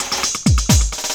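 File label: LOOP snare drum, Drum & Bass, 130 BPM; Ueberschall; Drum & Bass Resonance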